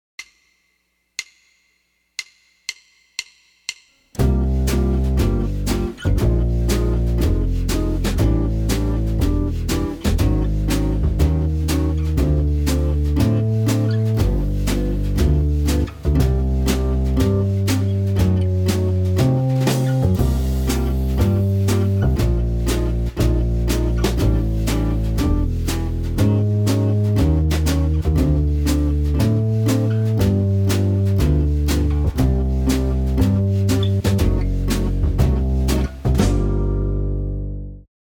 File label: Root 6 7sus4 and P5 subs